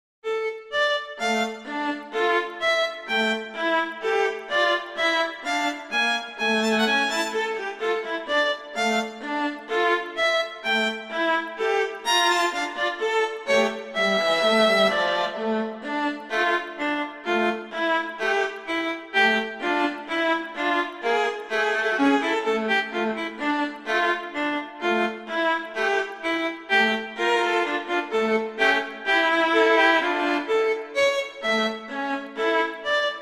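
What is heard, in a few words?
Tempo di marcia, violin viola; Mazas; Duets; Duet 5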